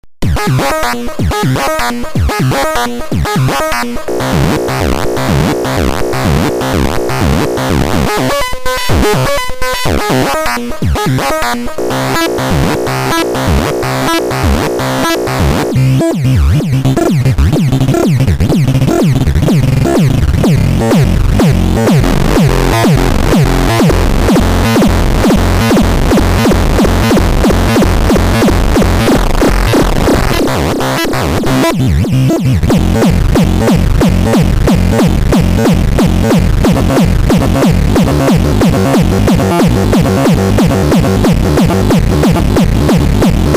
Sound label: hard soft synth